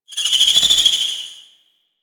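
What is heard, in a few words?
rocket launcher projectile whizz by; Enemies; Warframe; Kela De Thaym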